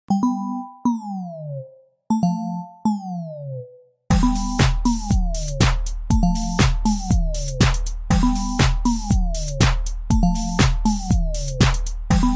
iPhone 11 Pro Ringtone; ringtones

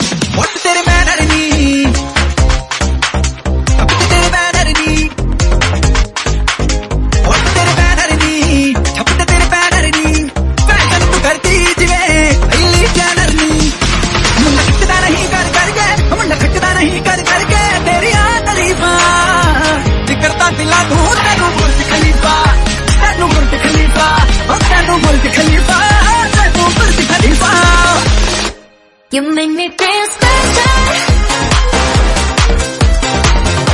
Remix Ringtone

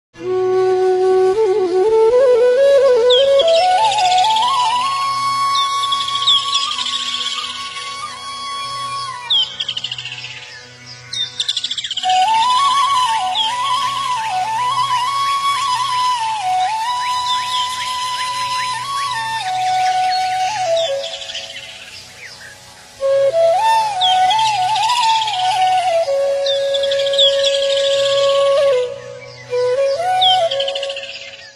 Marathi Devotional Flute Ringtone; ringtones

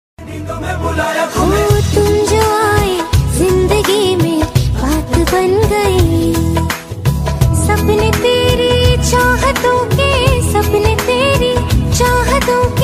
Song

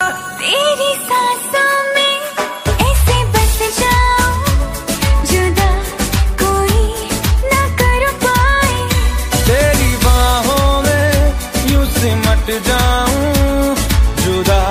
Old Song Ringtone

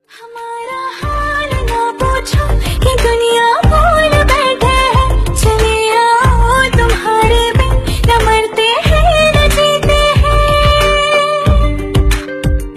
Ringtone Female Version

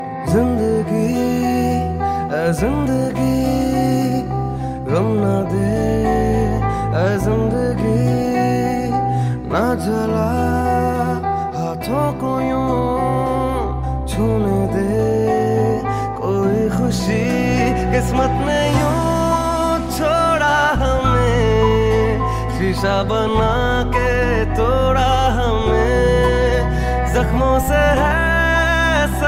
Sad Song Ringtone

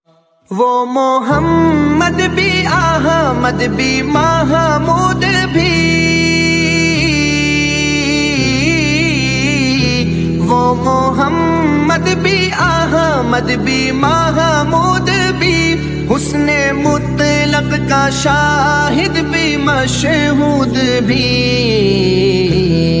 Naat Ringtone

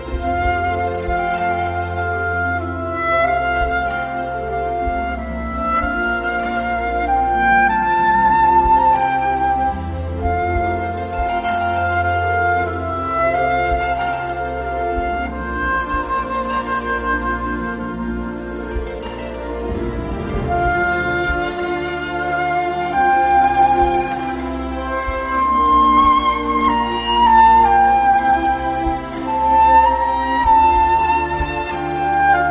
Flute Instrumental Ringtone

